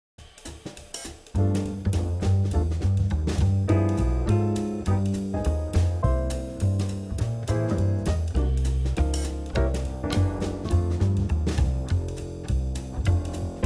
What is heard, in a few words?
Backing Track